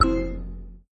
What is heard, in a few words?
reel start; games; Fenix Play 27 Deluxe; Wazdan